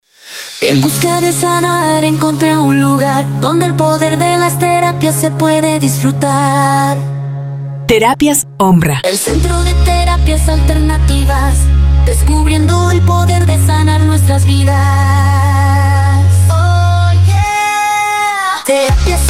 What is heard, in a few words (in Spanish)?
JINGLE COMERCIAL; TERAPIAS OMRA